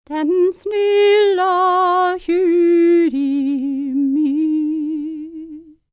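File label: Scandinavian, World Voices; ILIO; Vocal Planet VOL-5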